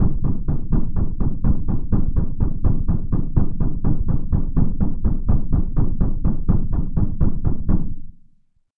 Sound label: taiko drum bass